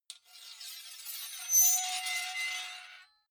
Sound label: sword blade screech high